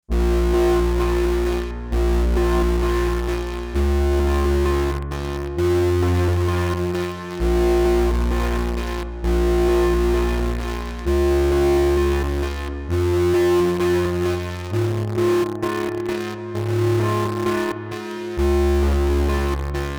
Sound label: Synth Distortion; SSL X-Logic Alpha Channel